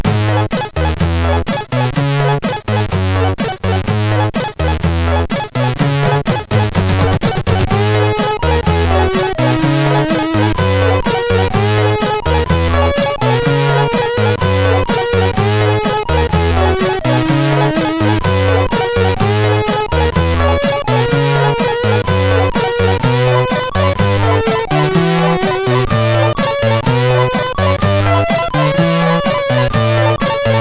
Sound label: Shortone, MOS6581R3; hvsc